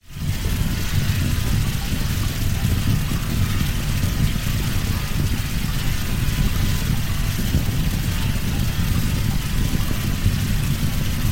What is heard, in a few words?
dark magical pulse